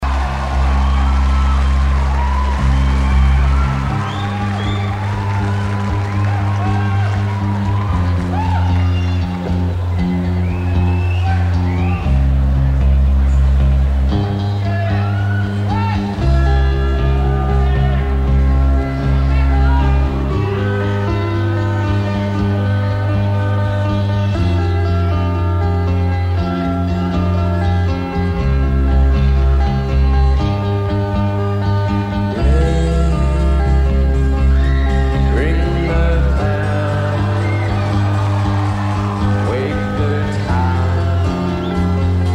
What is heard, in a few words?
Ring The Bells